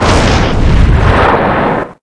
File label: Cannon; ASUS; P4B533; Intel 845E ATX